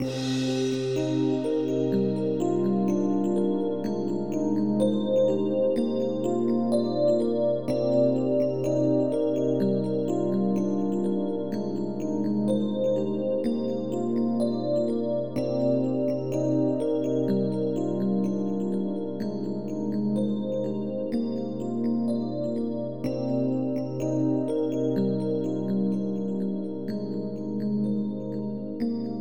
ambient

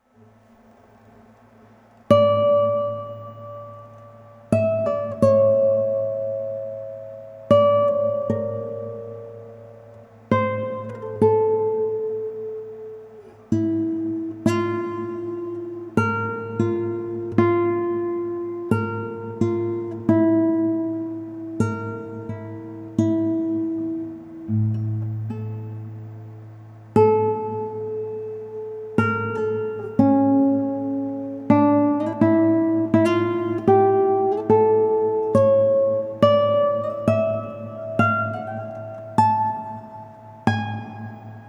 guitar

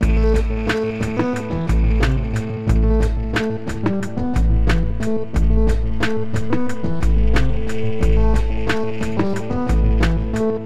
funk stuff

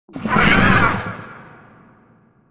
pain

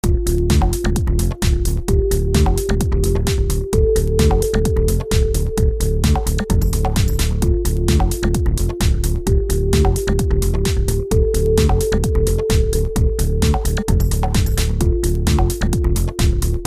boomboom, cubase

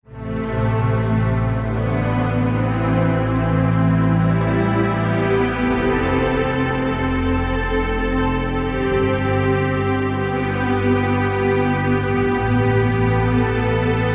strings

